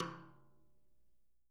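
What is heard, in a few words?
Living Drums, tom, stick; JZ XTOM 1; Sampleheads; Peter Erskine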